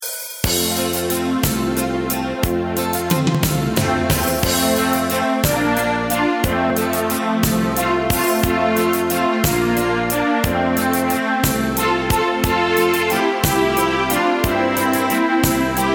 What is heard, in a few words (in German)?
Hochzeitswalzer